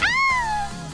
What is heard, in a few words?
aaaah~